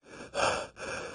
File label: space breath; astronaut